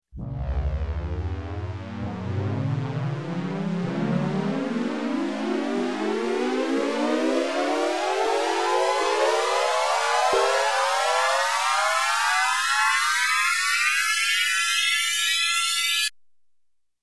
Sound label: Space Air Raid Siren, various, Electronic; Sound Ideas 2000 Series